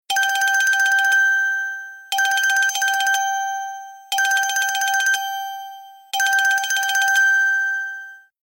Old Ring, Ringtones; SB210 Prototype; LG

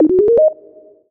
Failure tone; U370 Disney Mobile; LG

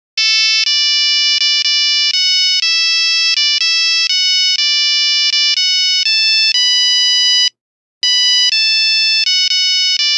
Nokia Buzzer; 3310; Auld Lang Syne; Windows Phone apps

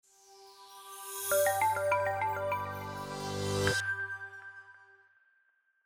boot audio; Qiku Luna-Youth

